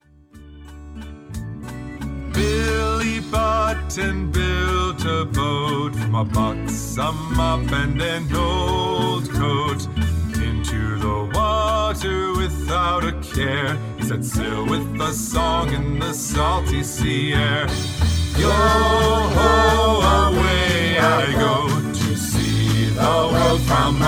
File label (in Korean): 영어남 동요